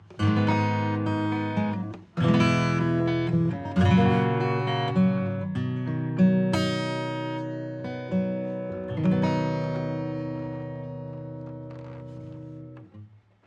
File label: 808 acoustic CURE 100; SubBass Doctor